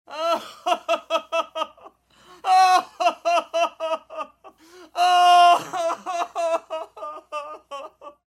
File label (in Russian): smeh i plach odnovremenno; Звуки сумасшедшего; идиотские звуки, которые издают психи в фильмах страшилках